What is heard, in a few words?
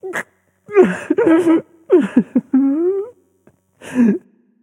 boo hoo; smite; arthur